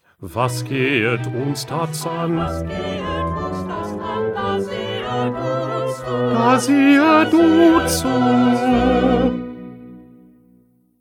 2nd Bass

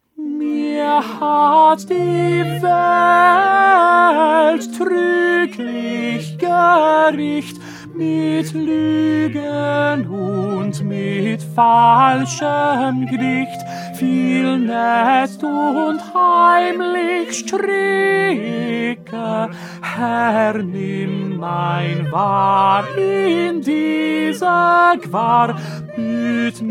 Tenor; Bach; St Matthew Passion

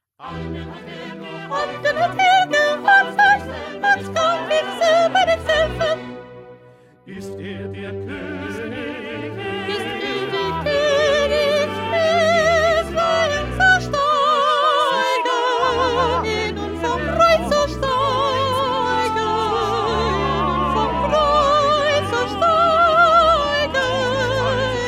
1st Soprano; Bach; St Matthew Passion

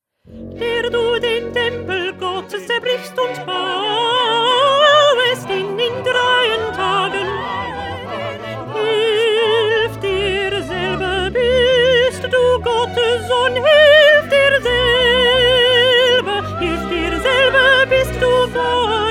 2nd Alto

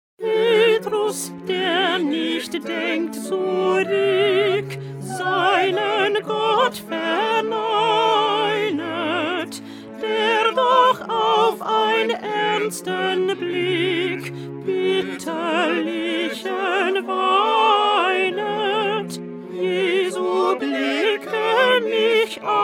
Alto

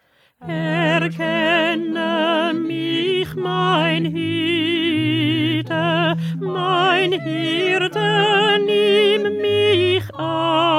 Alto; Bach; St Matthew Passion

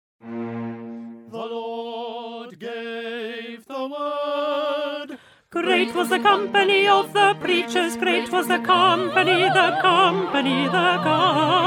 Alto; Handel; Messiah

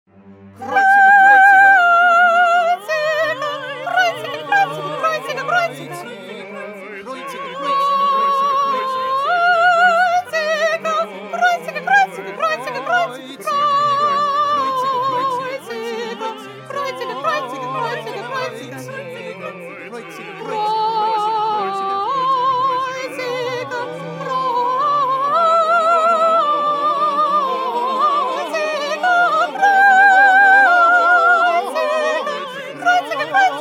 Soprano; Bach; St John Passion

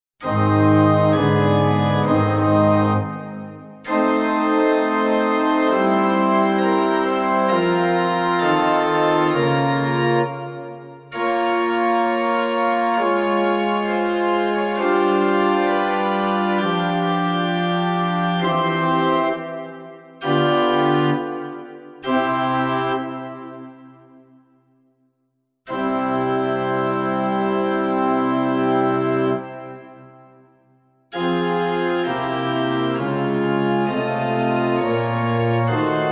Instrumental

